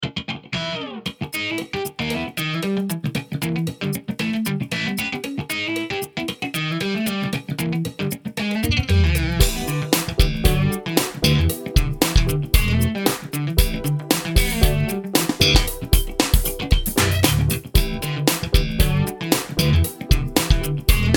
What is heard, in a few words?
Funky Blues, JazzGuitar